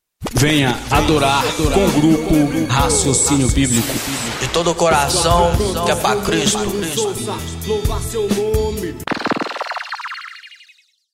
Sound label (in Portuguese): vinheta